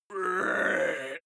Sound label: male shout hit